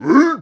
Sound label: Wind Up; Moblin; Zelda